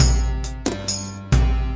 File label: tambourine plucky thing; WORLD LOOPS